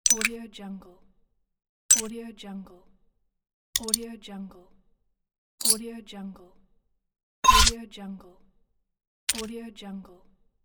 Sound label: rpg game ui tabs sound effect pack